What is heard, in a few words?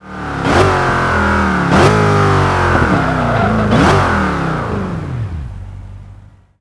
throttle off, vehicles; bowler